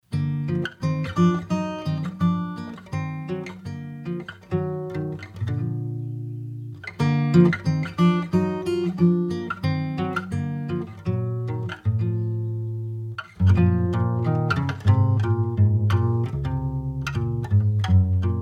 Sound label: Bari Walkup